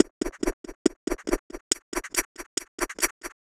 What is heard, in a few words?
perc 140, Percussive Loops 140 bpm; captured